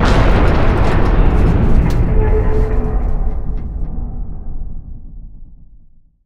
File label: impact; Quake 4 Alpha